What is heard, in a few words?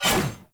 Sword Whoosh Heavy